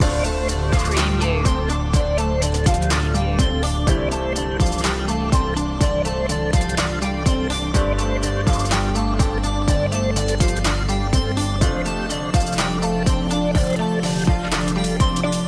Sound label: future waves loop